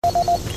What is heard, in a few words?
PDA Beep; Doom3